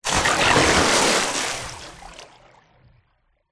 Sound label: nature water splash deep designed